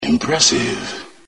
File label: pain; JK2; vader